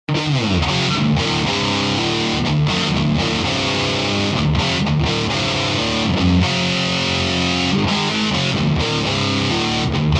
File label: badcat double gain